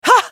spell cast effort, en US

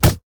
minotaur basicatk; LoL; alistar

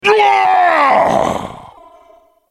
ultimate effort; Galio; Character Voices - English

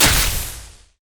basicattack; LoL; varus

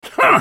effort; Twitch; en US

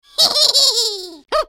Maokai laugh

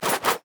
laugh; leona

